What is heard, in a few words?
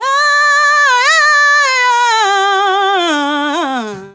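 ZULU CALL, SOLO FEMALE; Spectrasonics; Heart of Africa 2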